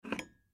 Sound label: Deodorant Grab, Deodorant Grab Bathroom Cupboard Hit Object Ding; Primping; Household; Blastwave